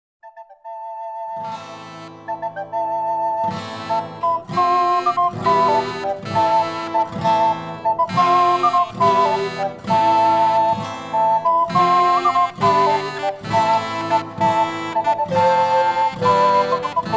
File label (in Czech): fanfara